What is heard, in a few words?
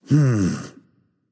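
non verbal thinking, voice; cernunnos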